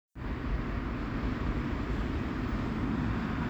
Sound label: risk of death warning system